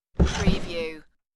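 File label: Drawer Open; Sounds in the home of drawers being open or shut.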